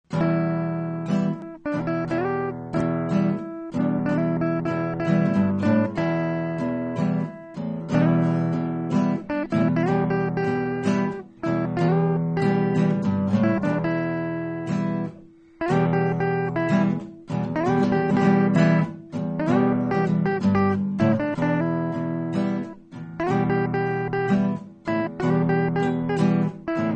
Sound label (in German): Em 3 toene; improvisation